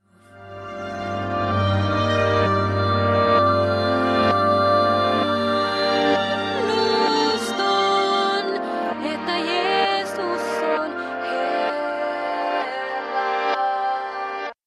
a love piano song

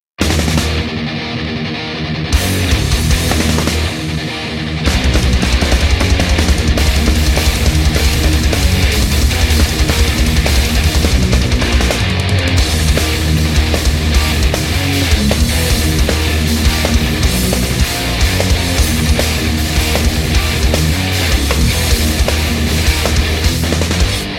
heavy metal

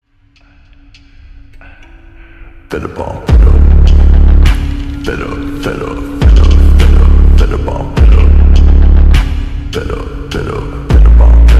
slowed reverb bass boosted

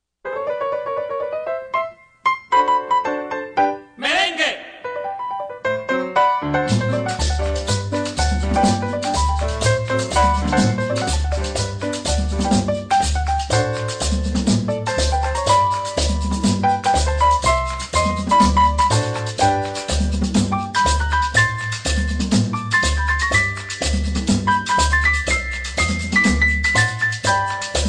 piano merengue; ringtone

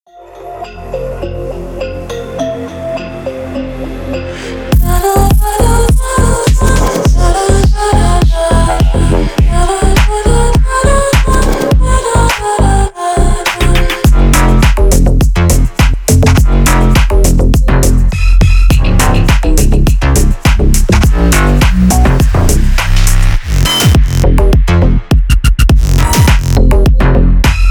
horror

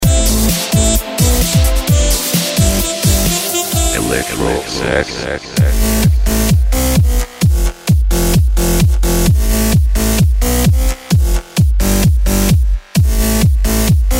electro sax; ringtone

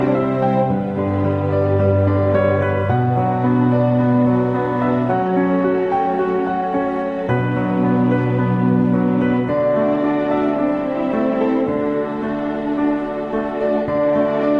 o holy night instr

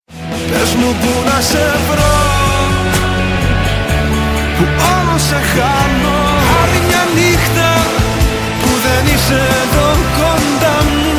greek mix

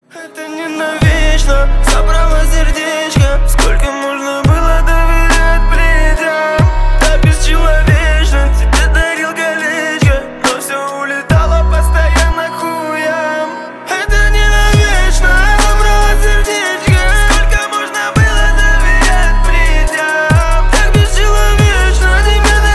groove

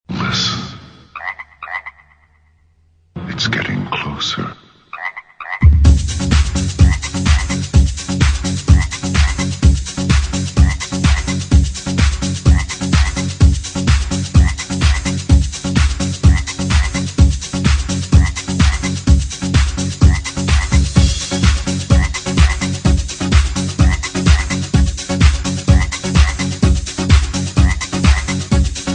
dance 90s

